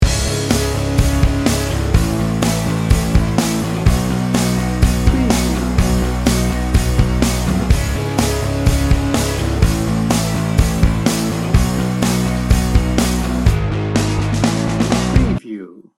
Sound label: reflective and hopeful loop